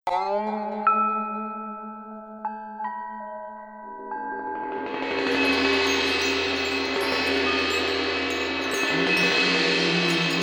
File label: om mani padme hum